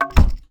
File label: reel Bounce; jingle spin